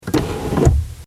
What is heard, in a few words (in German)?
Auto Schiebedach